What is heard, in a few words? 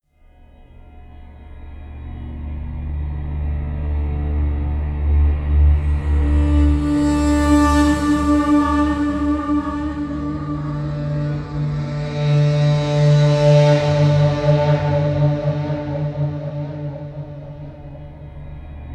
whale song, music elements